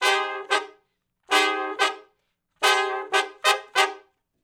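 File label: R&B Riff (Fmin, Abmaj) harmony; Sections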